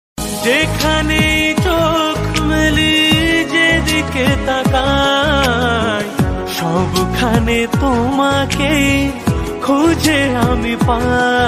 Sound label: Bengali Ringtone